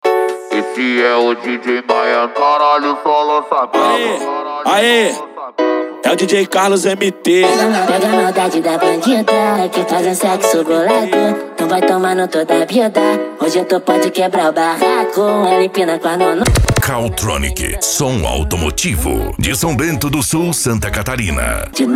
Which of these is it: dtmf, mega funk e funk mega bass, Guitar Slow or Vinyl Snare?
mega funk e funk mega bass